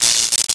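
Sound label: ambience electric arc; Star Wars Knights Of The Old Republic II; XBOX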